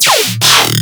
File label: BASS SHOT D#